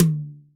high tom drum single hit d key

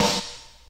sparkly rap snare drum sample a sharp key

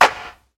hip hop clap one shot g key; single hits one shots

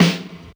phat steel snare drum f key; Mono; snare-drum-samples

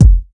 original kick single hit f sharp key; kick drum samples

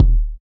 hot bass drum single hit d sharp key; kick drum

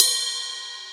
ride sound c key

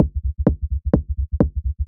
muffled washed techno kick loop; drum loops

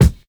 smooth kick one shot D key

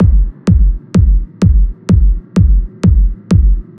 Techno Reverb Clean Kick

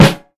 Raw Steel Snare Drum D Key

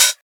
smooth hi hat one shot f sharp key; hat samples

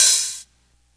long open high hat f sharp key; Duration 0:01; Samplerate 44100 Hz; Bitdepth 24

